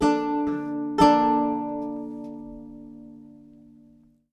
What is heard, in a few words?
Lute Performance; The Witcher 3 Wild Hunt